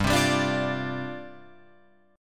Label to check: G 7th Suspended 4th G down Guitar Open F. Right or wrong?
Right